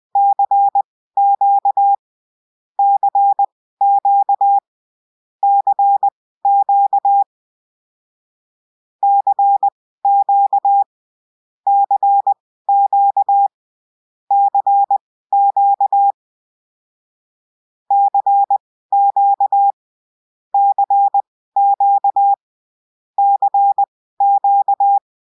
Morse Code CQ

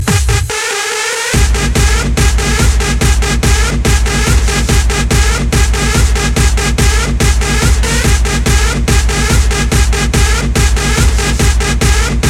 Shuffle

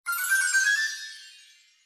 notification

